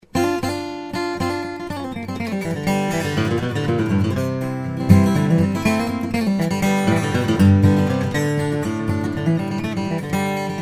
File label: dejonge dreadnought, steel-string flattop guitar